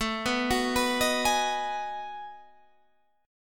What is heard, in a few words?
B 13th A, chord